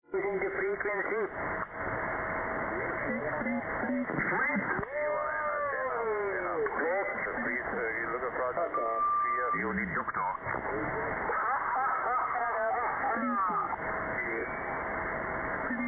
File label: QRM